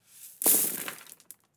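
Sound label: debris pebbles split sennheiser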